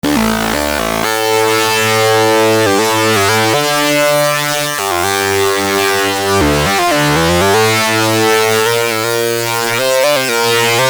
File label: saw aux; tellun